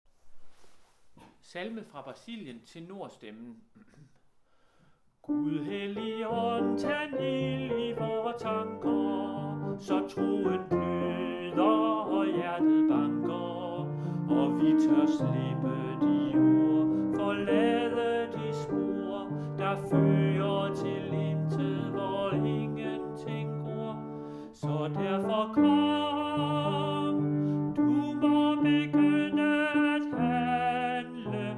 tenor; Salme fra Brasilien; harlev koret

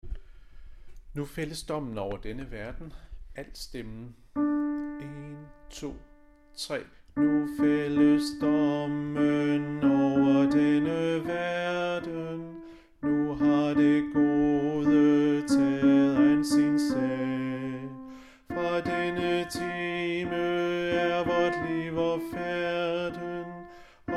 alt, harlev koret; Nu fældes dommen

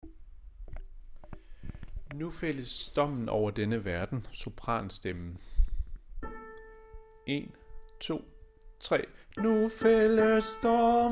sopran; Nu fældes dommen over denne verden; harlev koret

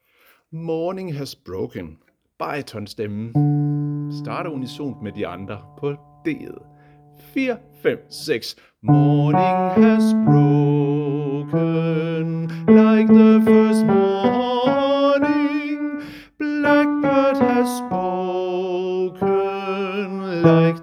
baryton; Morning has broken

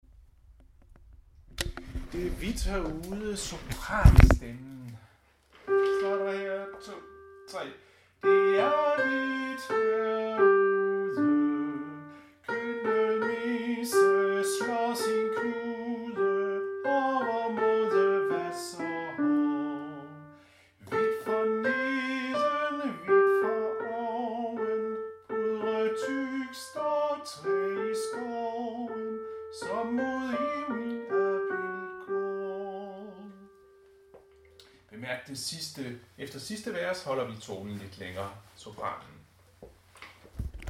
sopran; Det er hvidt herude

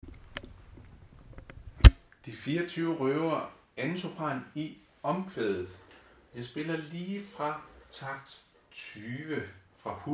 2. sopran (omkvæd); harlev koret

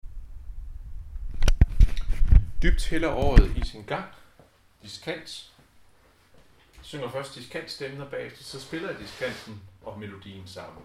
Diskant; Dybt hælder året

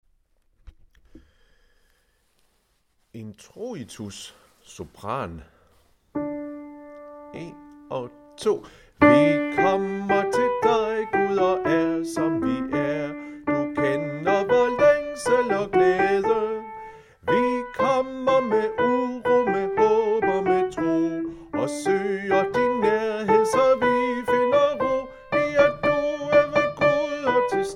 sopran; harlev koret

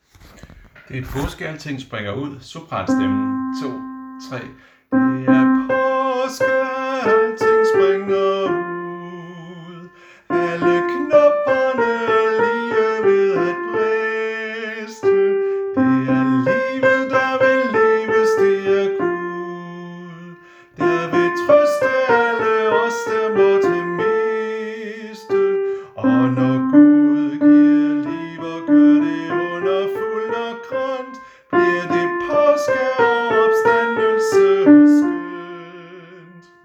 sopran

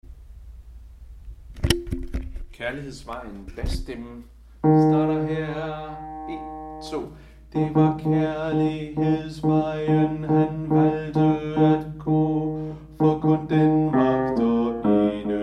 bas, koret; Kærlighedsvejen

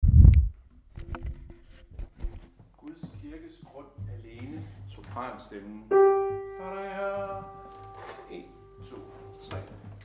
sopran; Guds kirkes grund alene

